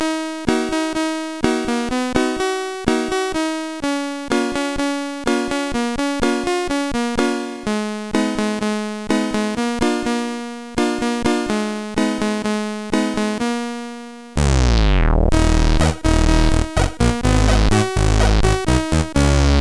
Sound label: blues sid CSG8580R5